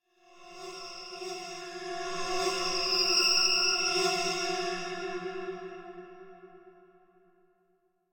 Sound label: Metallic High Pitched Transitional Tone - Eerie Musical Horror; Hollywood Edge; sound designer's toolkit v2